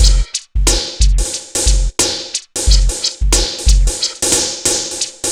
Synth Loop, BPM 90

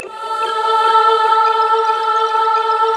AMBI PAD

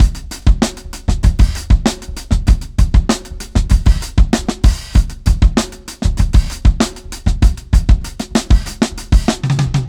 Brown Half-Groove 4-Bar, Breakbeats, Drum n Bass; KJ Sawka; Drum n Bass & Beyond

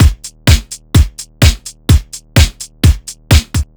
Drums, 127bpm, Nu Dance Electro; Zero-G; Soundclash; Combi Loops; Dooms Day

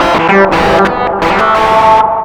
dist loop

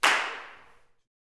CLAPS, Gospel; SNAP; ILIO; Vocal Planet

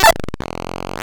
Squelchy Snare, drum machine; 300 Drum Machines; DevSnd

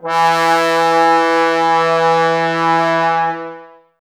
BRS TBS E, Woodwind Brass Ensemble, Brass; Miroslav Vitous - Woodwind Brass Ensemble

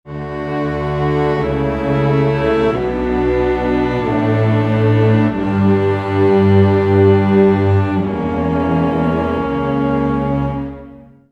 90 D maj str harm, Orchestral arrangements

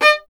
STR VLN, Solo Instruments; Miroslav Vitous

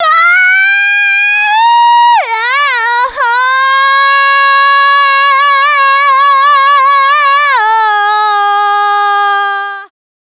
FEMALE, Dance Vocals

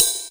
hi hat bell; Greytsounds; Scott Peers Sound Engineering

